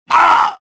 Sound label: choke; kyle